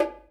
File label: Perc